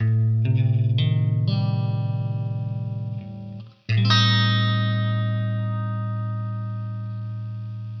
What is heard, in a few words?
A min7b5